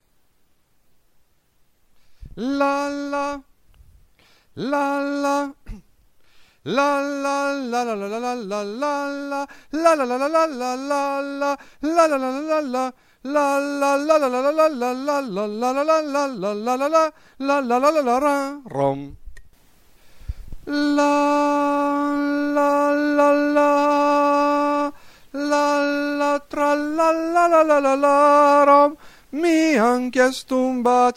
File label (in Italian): soprani, Canzone da ballo